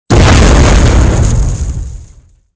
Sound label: explosion punch; weapons; Soldier Of Fortune II Double Helix; XBOX; explosions